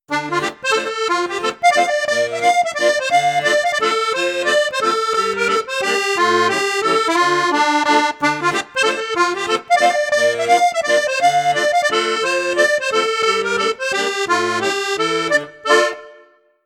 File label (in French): registre flute juste flute haute, accordeon; maugein festibal trio